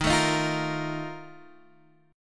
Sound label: F 11th Eb, chord